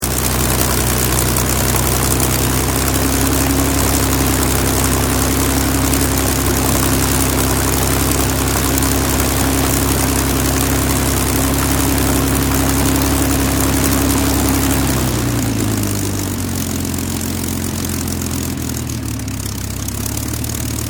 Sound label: Airoplane Spitfire Engine Varied Idle, Restored Spitfire engine; Airplanes (Sound Effects:Vehicles)